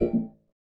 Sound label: lobby error; ui